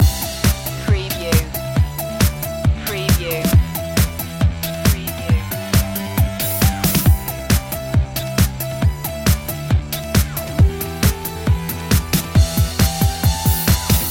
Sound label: digital kutz loop